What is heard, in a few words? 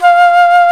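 Alto Flute vibrato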